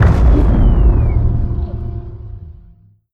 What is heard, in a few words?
disruptor fire, weapons; Stellaris